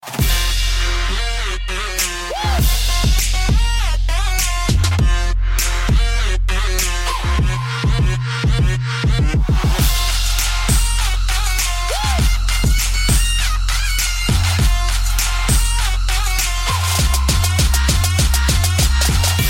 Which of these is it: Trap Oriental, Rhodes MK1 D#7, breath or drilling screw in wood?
Trap Oriental